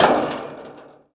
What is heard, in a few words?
bleacher land; Golden Crayon Sounds